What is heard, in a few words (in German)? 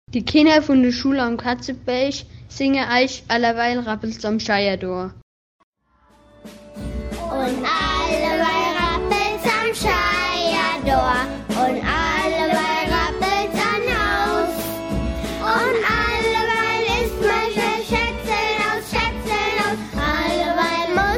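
Lied, Mundart; Alzenau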